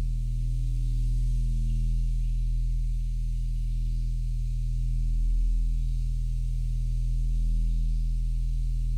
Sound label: Sky Drag Slow